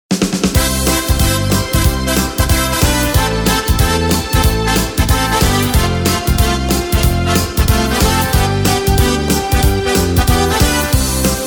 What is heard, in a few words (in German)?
Schlager Medley; Spiel Melodie